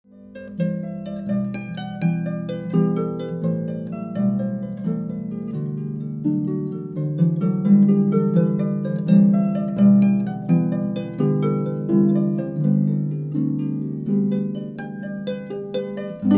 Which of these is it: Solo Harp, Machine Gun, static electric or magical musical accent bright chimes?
Solo Harp